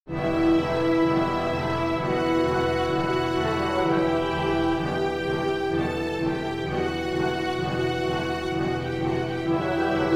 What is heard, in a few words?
symphony 2nd mvt end